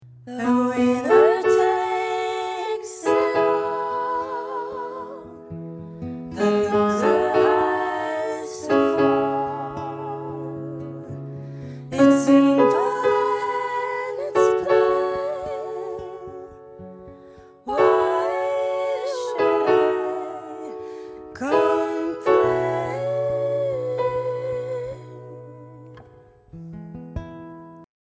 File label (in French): en trio voix guides vocaux